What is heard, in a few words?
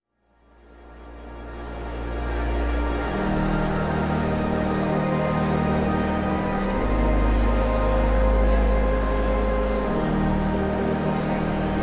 Organ Prelude; Night Of Song 2005